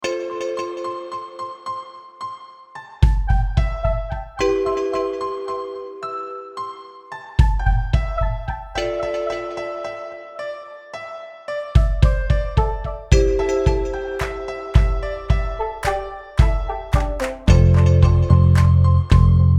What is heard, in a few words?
Pray Don't Worry instrumental